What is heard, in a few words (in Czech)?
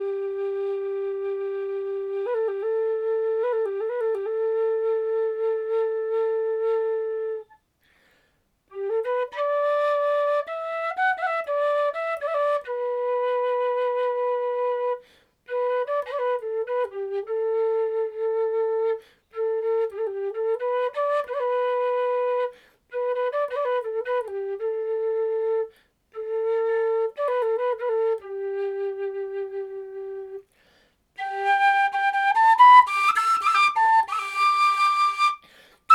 Pentatonická píšťala G dur